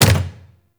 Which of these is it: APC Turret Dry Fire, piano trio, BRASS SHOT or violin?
APC Turret Dry Fire